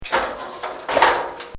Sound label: Door Opening; Doors (Sound Effects:Science Fiction); This sound effect was recorded in a custom built sound booth